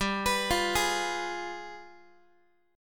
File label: G 7th Flat 9th, Guitar; arpeggiated; JGuitar